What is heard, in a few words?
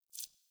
UI Default Theme Tooltip; Warframe